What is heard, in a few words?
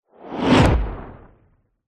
sound design cinematic whoosh dark fast horror impact; zapsplat